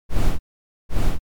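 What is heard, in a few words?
footsteps, Human; 2s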